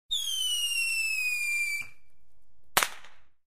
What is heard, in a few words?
Fireworks shot, weak explosion; explosions